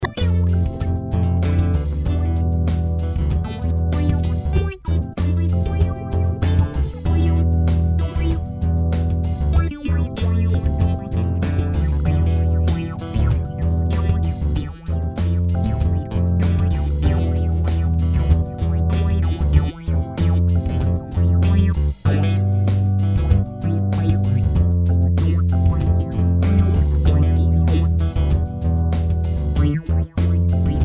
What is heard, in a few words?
chill out phaser